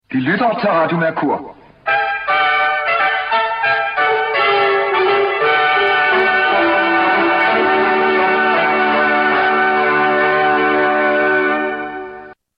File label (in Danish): Signatur; Radio Mercur